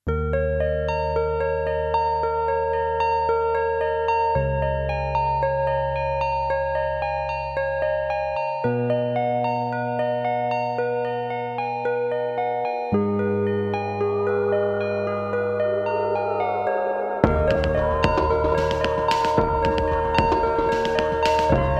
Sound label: Snow, Yamaha DX 7